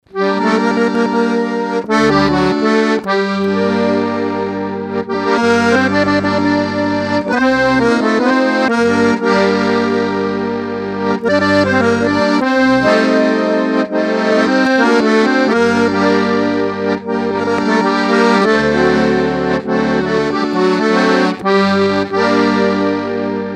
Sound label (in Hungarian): harmonika